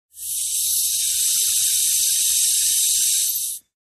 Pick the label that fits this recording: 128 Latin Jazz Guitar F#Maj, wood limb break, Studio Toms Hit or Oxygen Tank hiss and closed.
Oxygen Tank hiss and closed